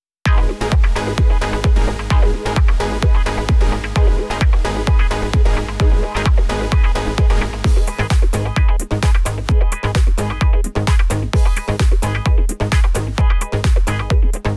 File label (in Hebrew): דאנס גיטרות